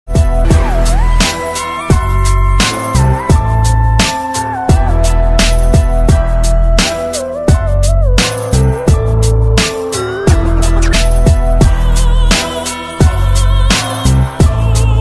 instrumental ringtone

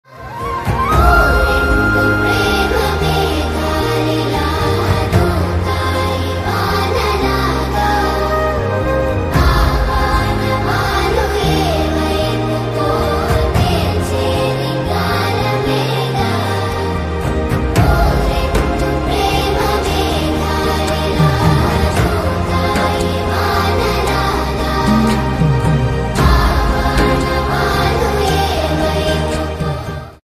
bgm ringtone Telugu movie bgm ringtone; baby movie

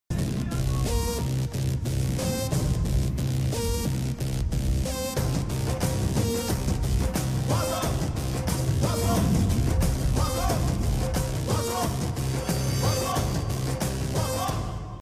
title card bgm ringtone; Gully Rowdy

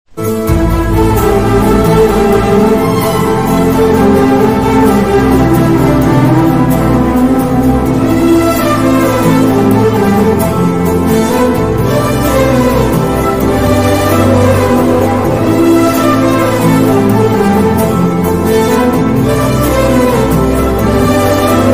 instrumental bgm ringtone love bgm; radheshyam

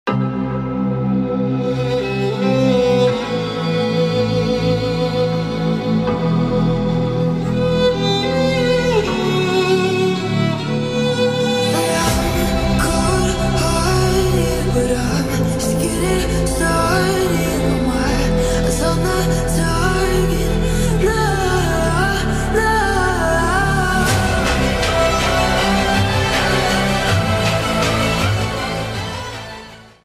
english ringtone beat; maestro

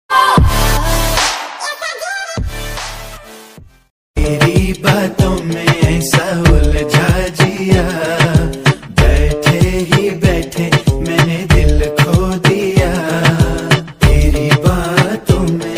hindi love music ringtone

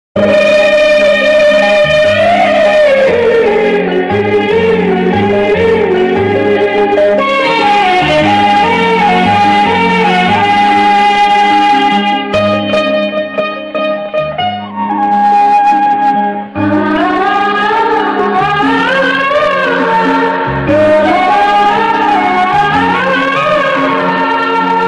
sad bgm ringtone; Jayam Manadera

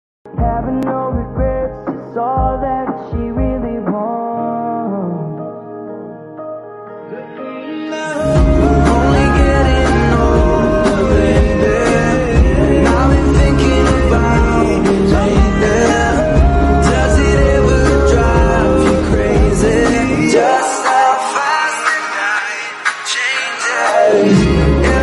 remix ringtone english x hindi bgm

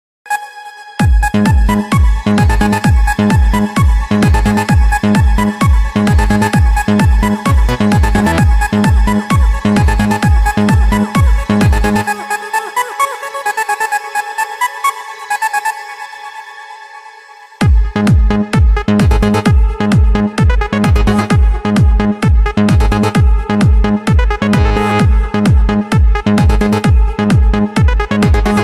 triangle violin classic ringtone; attitude ringtone; dj; muratti

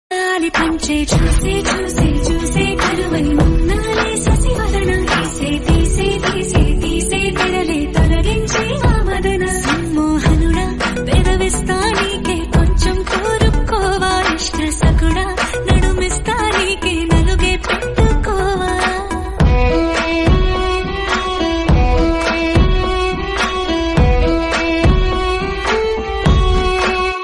telugu ringtone; rules ranjann

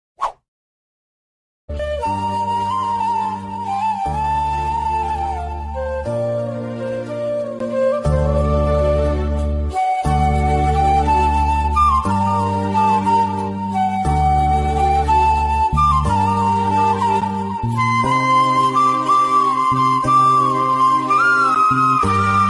love bgm; policeodu

